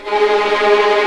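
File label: G#3 tremolo, String; East West; The Ultimate String Collection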